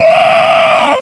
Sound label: Attack; KF Christmas; Elf